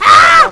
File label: pain; terroriser